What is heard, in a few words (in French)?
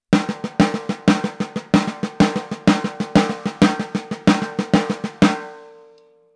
Caisse claire bossa